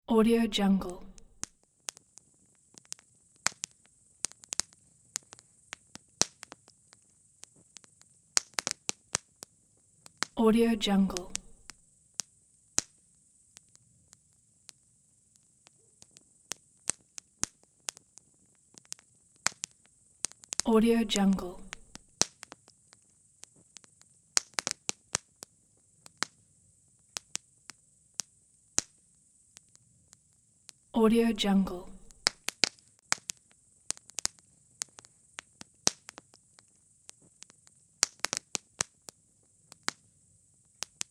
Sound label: night campfire; vinyl sounds